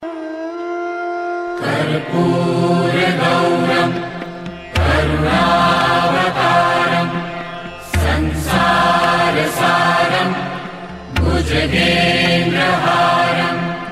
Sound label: mantra slow ringtone; karpurgauram karunavtaram